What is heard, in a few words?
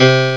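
Harpsichord (C2); X-Wing; X-Wing Patches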